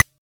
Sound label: flash